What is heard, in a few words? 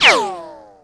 whine, weapons